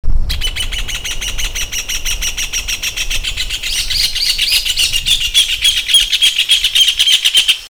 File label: Furnarius rufus duet